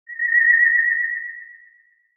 Multimedia Internet CD Rom Flash Animation Cartoon